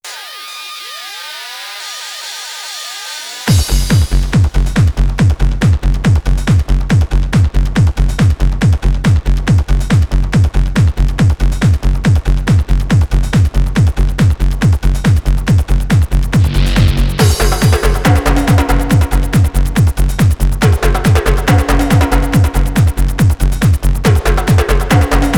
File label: Devil Drums